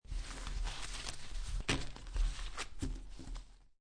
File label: Playing Cards Gather Cards From Table; Casinos